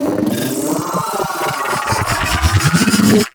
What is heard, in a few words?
Arachnoid Wraith Sniper Charge; Warframe; Corpus; Abilities